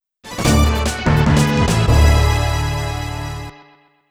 Battle End Fanfare; Devil's Scorn; Mouryou Senki Madara 1 & 2 Soundtracks Returns

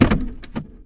Gearshifting; gamerip; Car Simulator 2; android

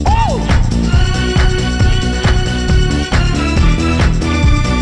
Disco; Monsters Inc. Scream Arena; GC

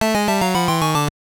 Jingle, gb; quiz sekai wa show by shoubai 1992

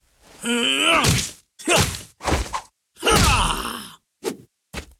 Mace; SFX 3D Heroloin Mace Spattack

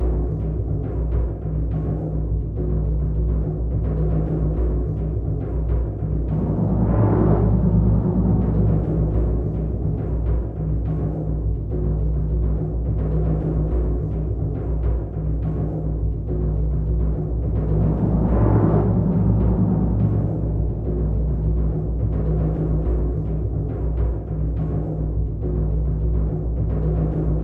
Ceremony Begins Loop

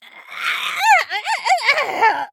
stagger

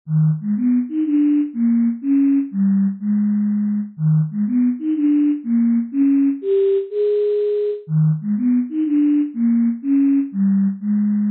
Whistle Spooktune; Undertale II Revenge of the Robots 2021

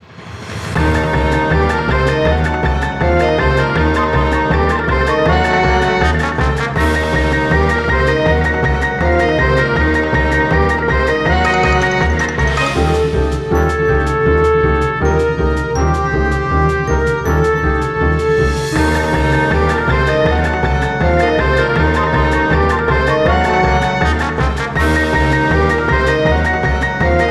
Full Drama Variation; the simpsons hit run; ps2

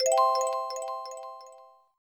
Warp Zone Fanfare; Giana Sisters 2

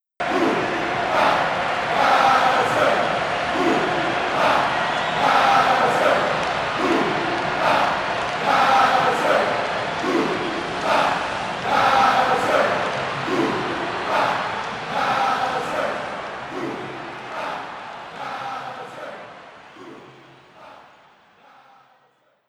Crowd Chant; mario strikers charged football wii gamerip 2007; Bowser

